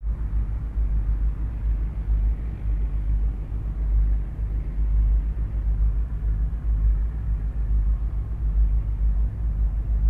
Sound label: Secret Government S, bgs